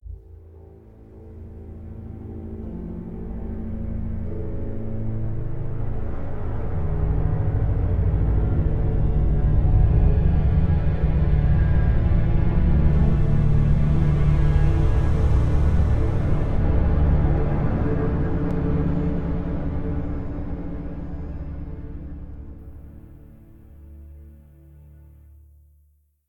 Eerie; the walking dead michonne

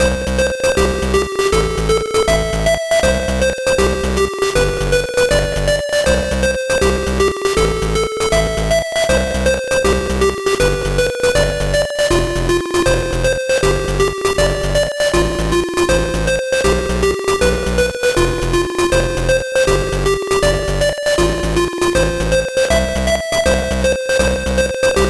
Level Music

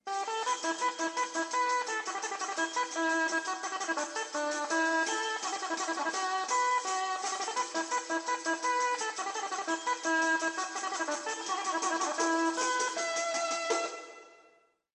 Crowd Music; Rival Knights; Android iOS

